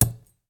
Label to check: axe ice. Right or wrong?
Right